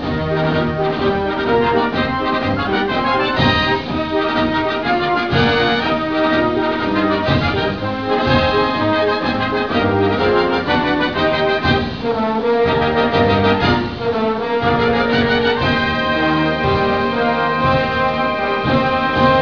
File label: anchor